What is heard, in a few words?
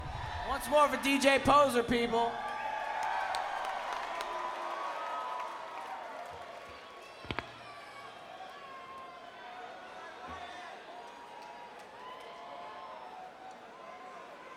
Crowd; The Vic Theatre Chicago IL USA